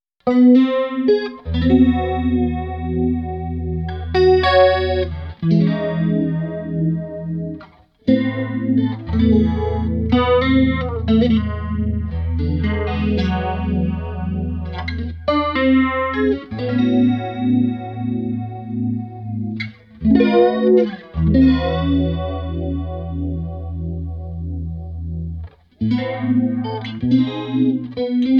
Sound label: WaterFall Ripple